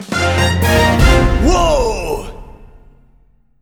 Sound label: Victory Music Win; gamerip; Hero Defense Haunted Island